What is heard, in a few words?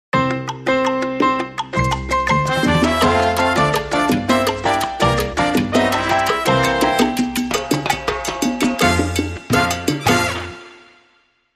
Salsa Tropical